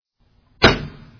lock sound

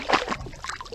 Water Drop Splash

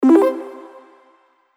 counter celebration

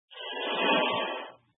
going up

